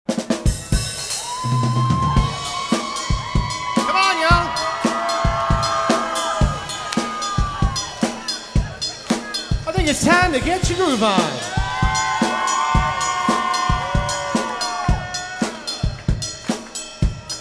Polka, Live Jam

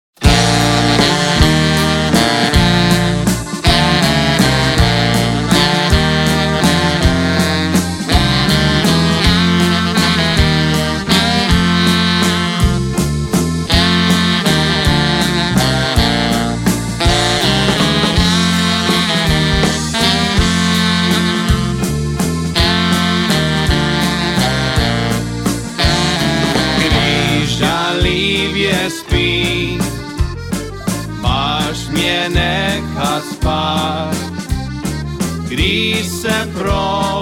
Waltz

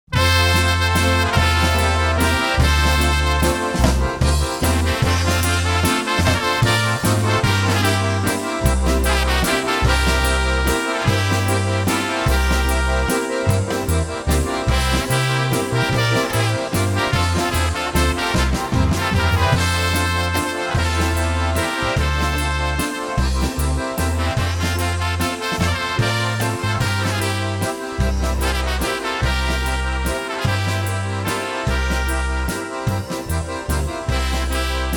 Waltz Medley

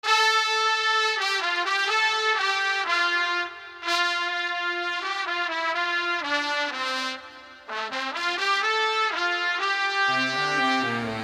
Polka

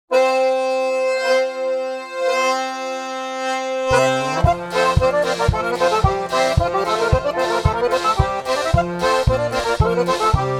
Polka

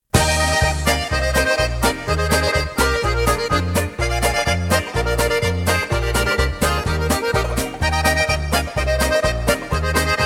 Polka

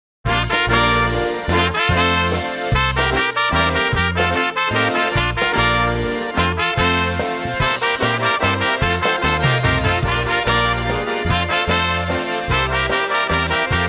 Waltz

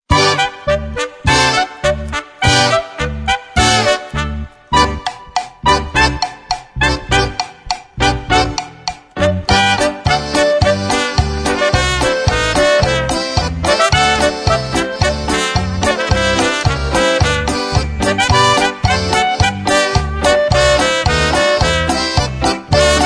Toe Tapping Polka

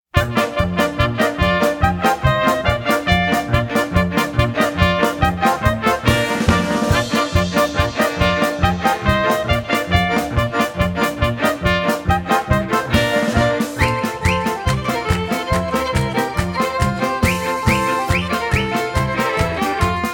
Polka

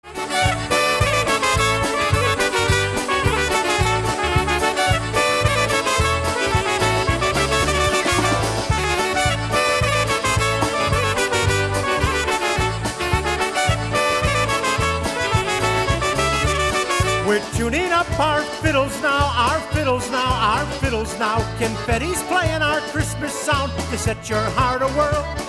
Medley

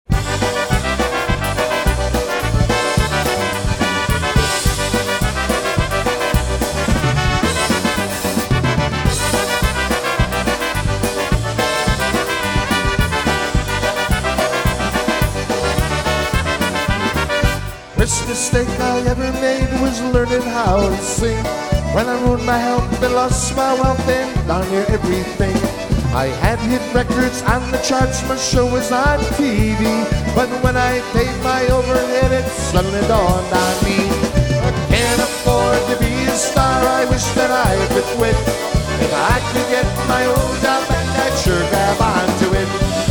Polka, Live Jam

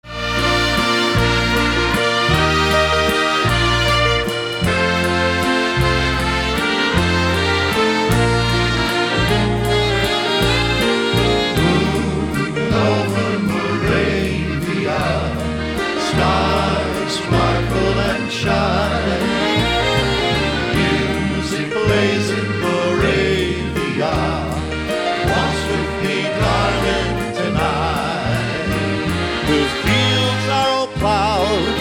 Waltz

